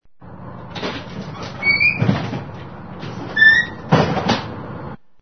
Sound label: BUS; Transporte